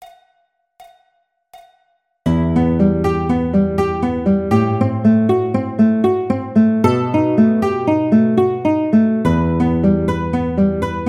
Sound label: Estudio In E minor Guitar; Tarrega; classical; guitar sheet music